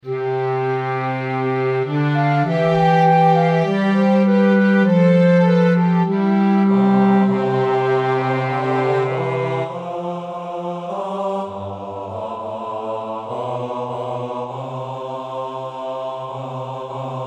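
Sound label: Bass, choir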